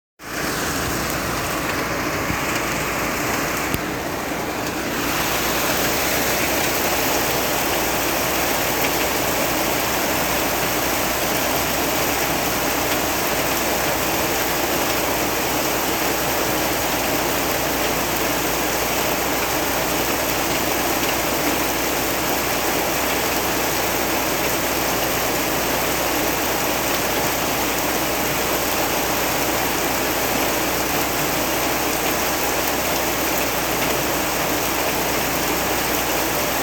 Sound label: rain sounds for relaxation